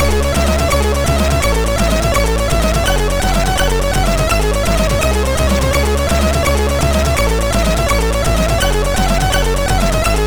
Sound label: Changer Vie sid MOS6581R2